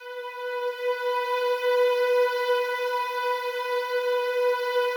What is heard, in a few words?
slow strings B3, Yamaha VP-1; 90 s Sample CDs; Music Store